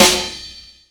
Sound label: airy steel snare drum f sharp key